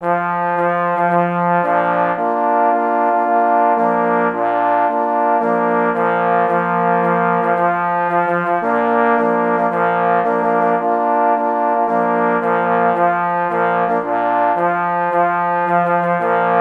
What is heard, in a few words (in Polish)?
Hejnal na 3 parforsy; A. Kanawka; Hejnal Ksiecia pszczynskiego